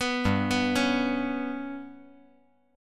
G Major Flat 5th chord

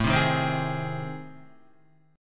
A Minor Major 7th Flat 5th, Guitar; AmM7b5 strummed; JGuitar

